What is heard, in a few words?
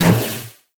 Guardian Shell Impact Default; Warframe; Focus; Vazarin